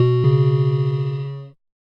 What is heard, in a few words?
ding